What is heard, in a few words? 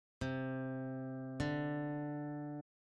interval major second, guitar